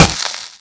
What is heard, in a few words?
sand impact, Bullet Impact; Dystopia; physics